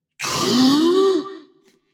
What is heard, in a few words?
breath; smite; osiris